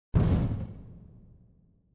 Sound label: explosion